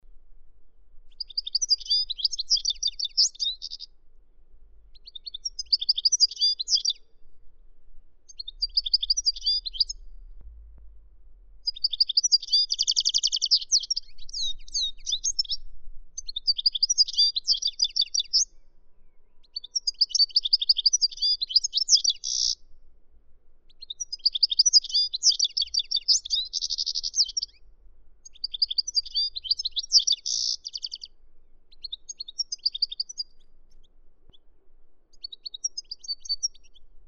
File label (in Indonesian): suara eropa goldfinch